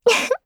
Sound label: laugh; Eternal Return; Garnet; KOR